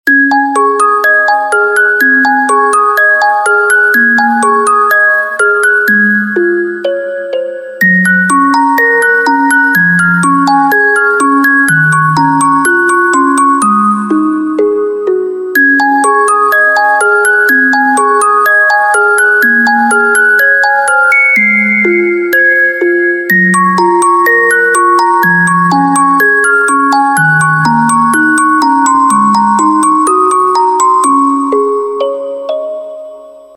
Wonderful music box, ringtones; ZTE Maven 2 Z831

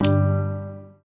Disconnect; Nook BNTV250; notifications

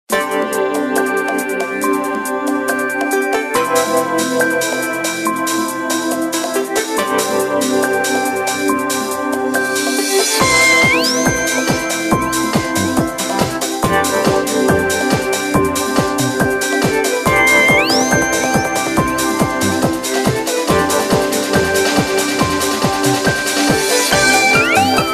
Little party night, ringtones; ZTE Maven 2 Z831

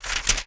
Page turn; Nook BNTV250; ui

